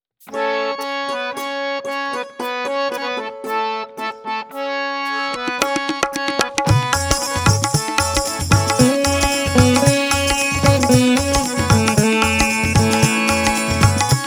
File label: Gents Karaoke A Pancham